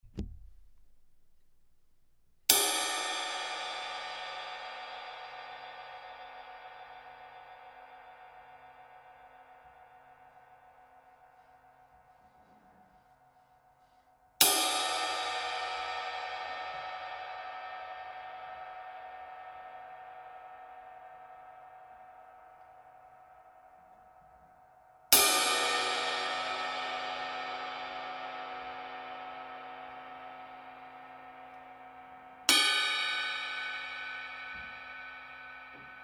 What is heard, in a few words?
Ride Medium 20; Amedia; Classic